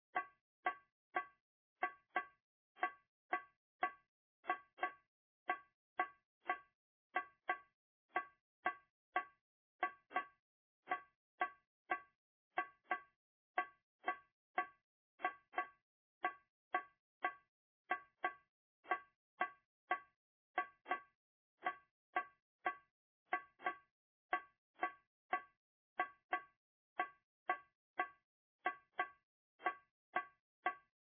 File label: clave